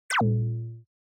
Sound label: Onoma Negative Dry; オノマトペ ネガティブ; ドライ